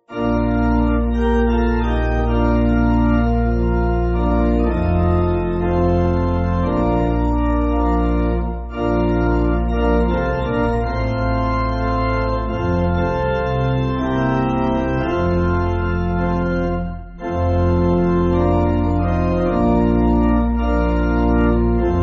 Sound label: Pipe, church music